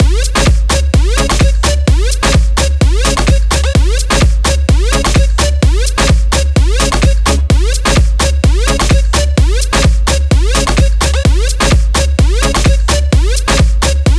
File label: Dirty Dutch Mix; Mutekki Media; Vengeance Electroshock Vol.2